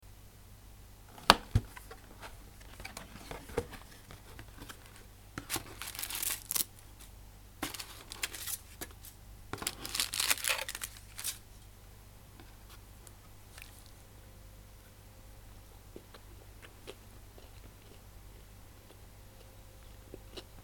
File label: box of chocs and eating one